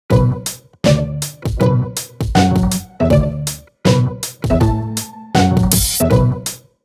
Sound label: DJ Debut Beat Juggling Pattern